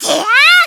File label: attack; kirby